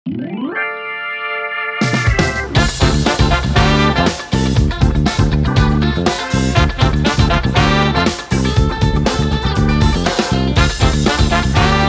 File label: Now Loading